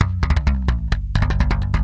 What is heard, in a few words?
130 fast rolling hammerbass, bass loops